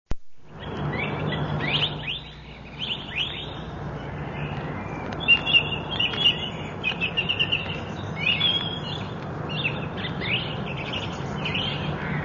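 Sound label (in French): nature oiseaux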